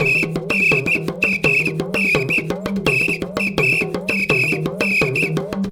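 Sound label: tama foudang groove; Best Service; World Colours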